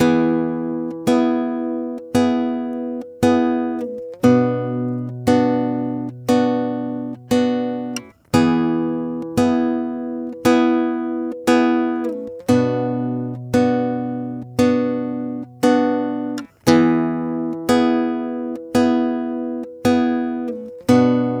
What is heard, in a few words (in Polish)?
wstep uproszczony rytm E h, gitara